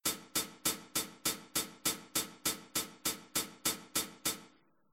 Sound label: Swing basic